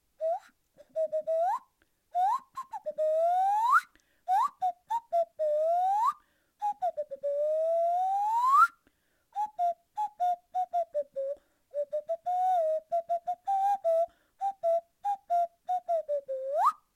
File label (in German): nasenfloete